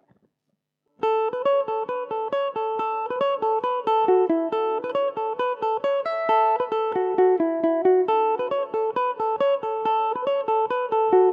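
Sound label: A major revelation, Irish mandolin; britches full of stitches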